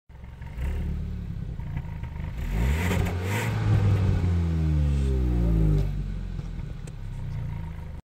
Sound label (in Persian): Tucson, ماشین